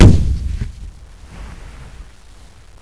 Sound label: FIRE